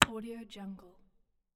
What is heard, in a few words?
wooden block toys stack